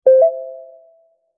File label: Notification ping; Sound FX